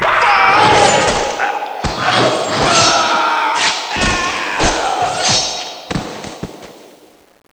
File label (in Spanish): masacre, sound ambiente; cs16; ze zero